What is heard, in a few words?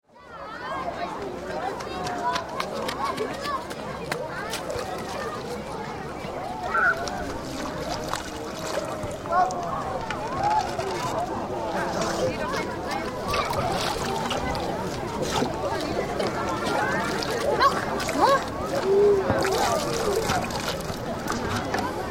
Seaside Kids, Children playing on a crowded beach in summer.; Holidays